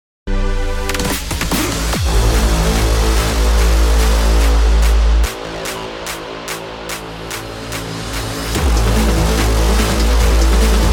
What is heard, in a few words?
dubstep session; לפורים